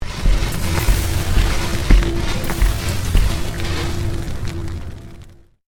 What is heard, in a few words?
Created Magic Spell Meteor Shower; Series of created spell sounds